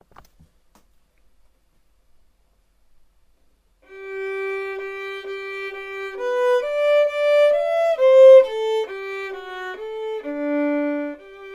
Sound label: vln perf; Building Technique with Beautiful Music, Book 1 Violin